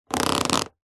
office chair leather sit down creak

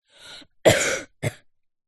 human boy aged 9 cough

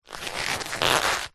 leather handbag or pouch handling creaks

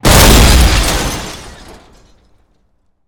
Explosion Metal Debris; Blastwave FX

